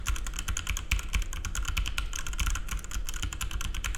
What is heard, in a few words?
Black Switch